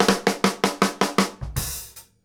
FILL; PlatinumPhattCD1; E-MU Producer Series Vol. 8 – Platinum Phatt; Grooves Filz Hitz

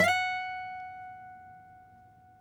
F#, guitars; Spectrasonics; Hans Zimmer Guitars VOL-2; FNGSTYLE PFM

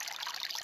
Stream LP, Loops; Yamaha DTXpress-II

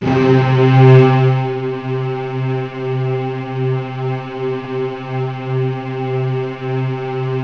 SWELL STRING, ENSONIQ VFX; Rubber Chicken Software Sample Bank

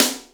Snare, Ensoniq ASR-X

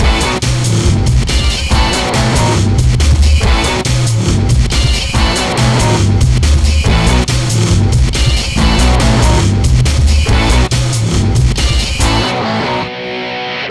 Hardcore Breakbeat, 140 Bmin; Big Fish Audio; Hardcore Breakbeat Action; Arena Rockin

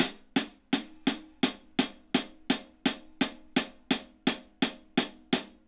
HAT, HI HAT LOOPS, Dub; Drumdrops In Dub VOL-1

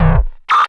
BLURP LOW, Hard & Loud Techno

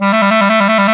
WND TRILL, Clarinet FX

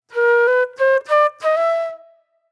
Flute

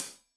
Hat Tight, Hi-Hat; Deeply Sampled Drums

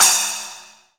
CRASH, MONO CRASH

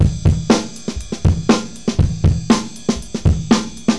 Big Beat

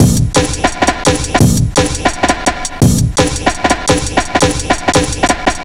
Drum Bass, Drumloops, 170bpm

